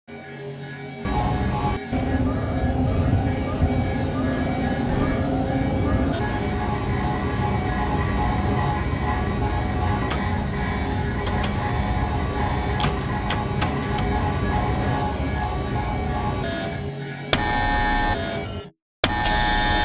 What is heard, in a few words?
alarm chernobyl